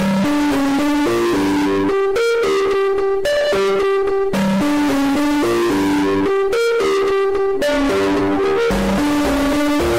plants vs zombies theme bass boosted

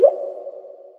water drop plop; metal gear alert

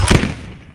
paintball gun

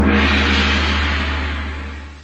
gong sound

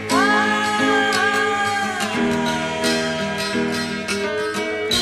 aah aah aah

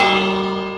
bell; minecraft

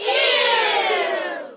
ewww crowd effect

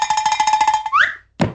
cowbell shakes short zip up and thump

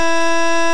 wii error

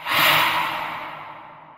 ghost attack; phasmophobia

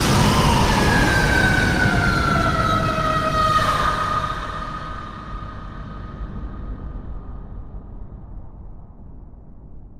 sudden loud horror scream

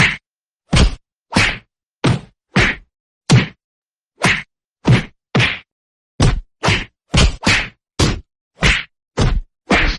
multiple punches owch

